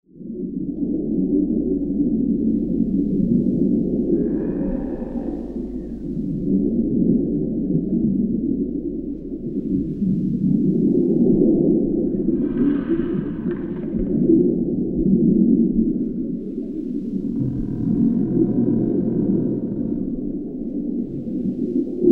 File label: sea monster